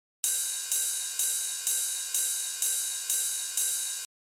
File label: Ride